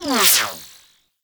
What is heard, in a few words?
Laser Whizzby; Warframe; Teralyst; Vomvalyst